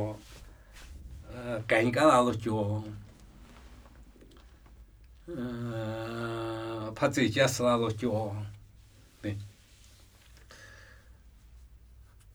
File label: Namuyi Tibetan Ritual Chanting